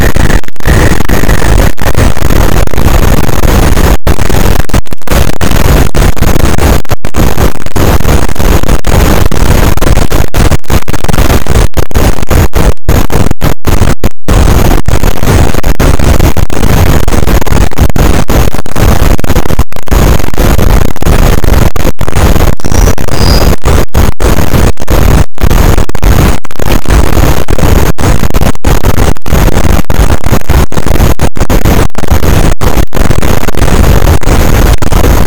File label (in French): cachalot negatif, boat, Physeter Macrocephalus